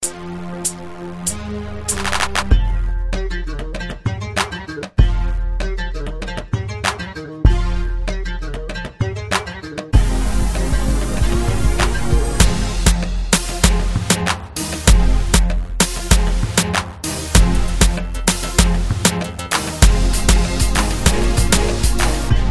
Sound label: Instrument; Gaza